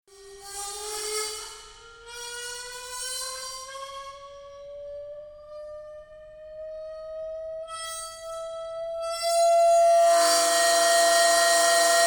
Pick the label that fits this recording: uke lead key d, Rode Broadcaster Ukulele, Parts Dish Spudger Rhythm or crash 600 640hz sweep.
crash 600 640hz sweep